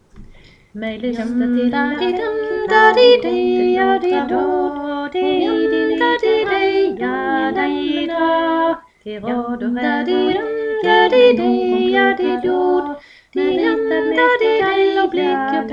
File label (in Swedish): Friarvisa, melodi, stamma, bastrall